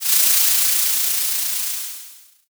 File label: frying